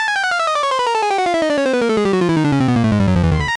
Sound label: Tumble Music